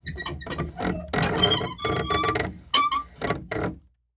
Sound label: Household Gate Wooden Gate With Metal Parts Squeak